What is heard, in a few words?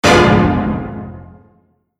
shock